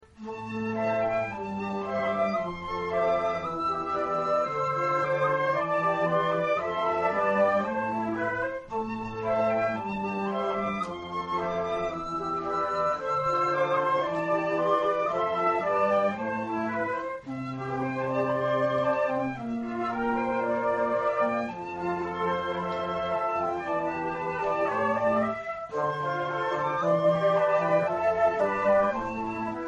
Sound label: concert, flute; AUTUMN concert 2016